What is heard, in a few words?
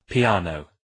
piano